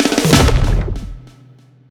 fire; smite; god raijin

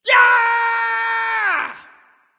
battle cry; smite; apollo

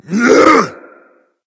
grunt attack berserk; cuchulainn

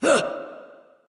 grunt jump ghost; smite; voicepack; hel

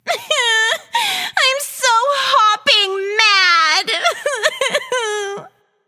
boohoo; Smite; athena

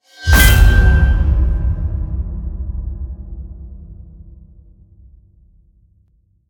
landing page hit; smite; odin

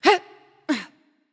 grunt jump; smite; themorrigan

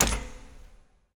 movement fire land; smite; Arachne

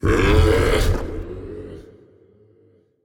"ugh"; smite; sylvanus

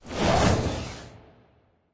Death Fly; smite; horus

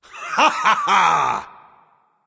yay; smite; ares

